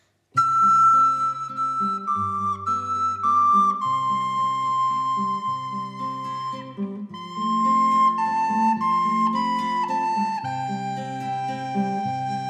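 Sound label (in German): Leise rieselt der Schnee Flöte Gitarre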